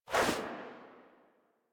Speargun Throw Release Foley; Warframe

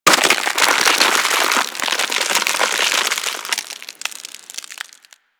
Freeze Ray Frozen; Warframe; Weapons; Corpus

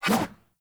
Sword Whoosh; Weapons; Player; Swords; Garren Sword

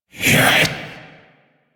Possessed Red Veil Vocal Attack; Enemies; Warframe; Priest Quest

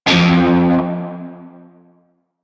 Shawzin Oct One F; Music; Warframe; Narmer Shawzin

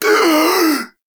Friendly Revive Complete Gasp; Friendly Grineer; Warframe; Veilbreaker; Kahl

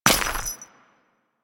Step Dirt, footsteps; Warframe; Grineer Royal Jester; Foley

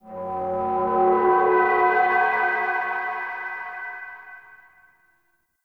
Bell Texture, Crypt Ambience; One Offs; Champion Crypt

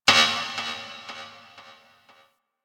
Ship Energy Knock; Ambience; Warframe; Corpus Ship Remaster

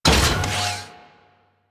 Reactor Deactivate End; Warframe; Ambience; Corpus Gas City; Gameplay Remaster

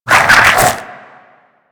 Infested Whip Spin

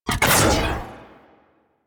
Arch Gun Alt Shot; Warframe; Archwing; Hildryn

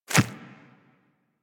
Infested Small Walk Default, Footsteps; Warframe

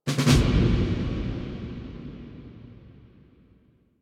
Merchant Missions Close; Warframe; DE; Hubs; Veilbreaker

